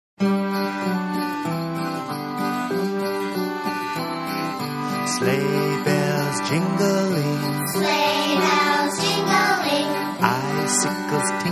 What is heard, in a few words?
Sleighbells Jingling